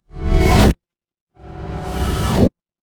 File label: magic scifi teleport